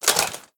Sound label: mvt armor arms; smite; god arthur